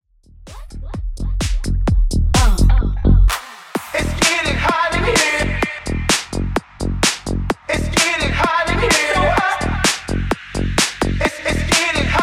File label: Tech House 128BPM; remixplanet